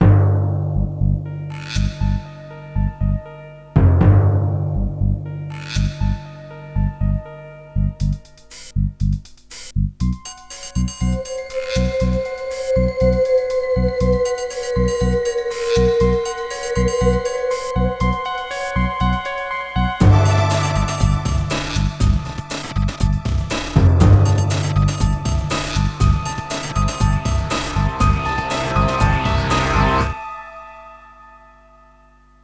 Timer; urbanchaos; GeneralMusic

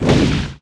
FLAMER

